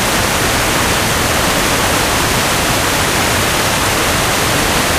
perimeter waterfall sweetener close; Source Engine; tf; lair

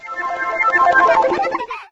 utopia windows exit; windows 9x

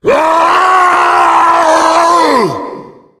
tank yell, voice yell; Left 4 Dead; Source Engine

